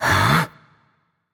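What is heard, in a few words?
nonverbal confused; voicepack; smite; merlin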